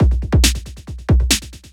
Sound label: drum 138; Techno; drum-loops; xtra-drumloops